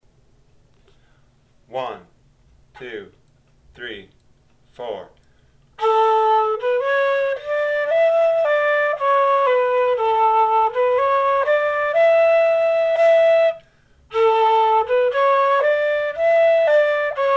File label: Inas Polka slow